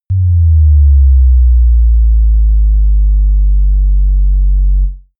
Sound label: bass drop soft dirty